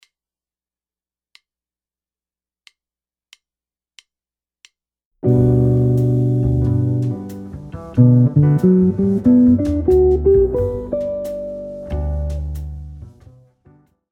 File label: easy maj7 arpeggios